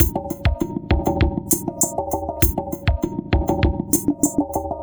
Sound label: Tabla Beat; Sonic Foundry (Sony Creative Software); Myths of Technology; Composite Beats